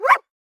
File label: whimper; Rust